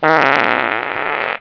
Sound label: zzzzzzip